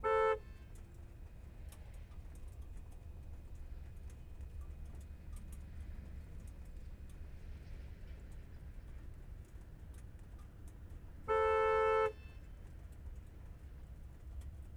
lamborghini aventador, onbrd, horn, various, exhaust, right; 2014; DPA4062; Pole Position